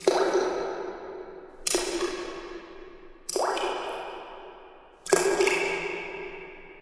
water droplets echoing

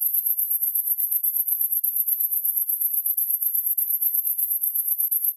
cricket chirping

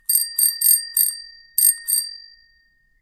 bicycle bell

